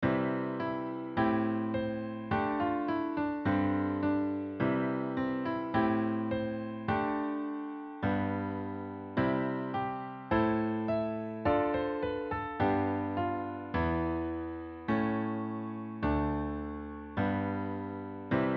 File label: chords c major